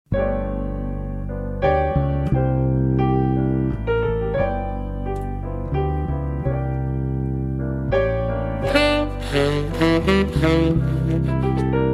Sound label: Jazz; Tukan